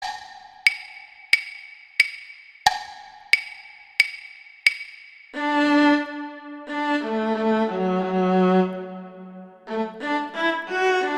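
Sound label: Duet 3 Cello I accompaniment; Duets; Mozart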